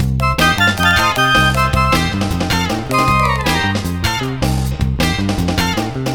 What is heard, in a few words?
Flutes & Swingers Full C; Zero-G Kocktail Kollection; Construction Kitz (Lounge)